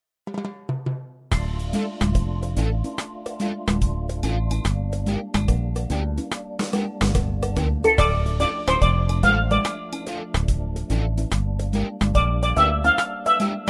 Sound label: reggae blues